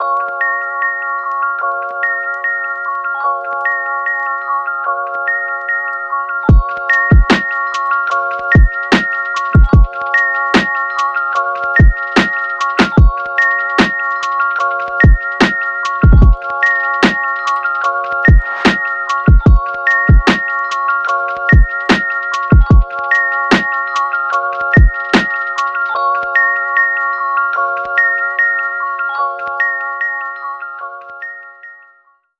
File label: Lofi